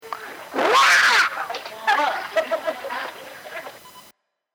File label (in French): Lion